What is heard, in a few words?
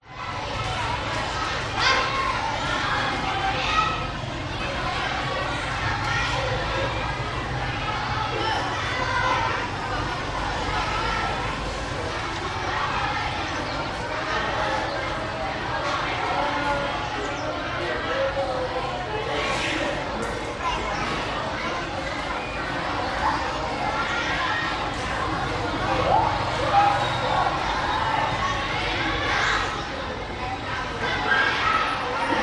public places school playground busy kids children walla vietnam